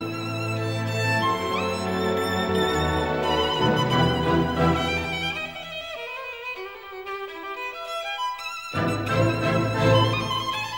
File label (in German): konzert fuer violine und orchester e moll op 64 allegro molto appasionato